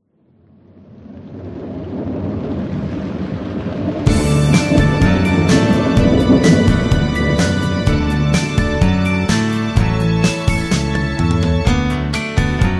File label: Two Step Instrumental